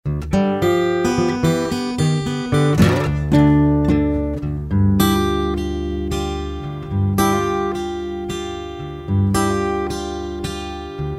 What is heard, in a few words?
blues